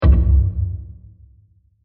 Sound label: Deep Impact; Low Impact; Impacts; Crafted Signals